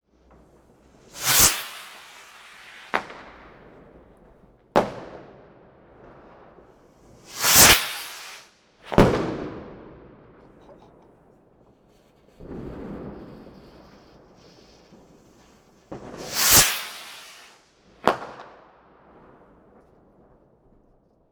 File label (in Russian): fejerverk vzrivov v vozduhe